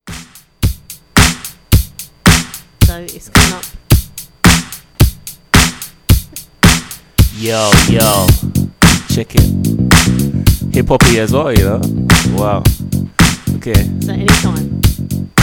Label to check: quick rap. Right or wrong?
Right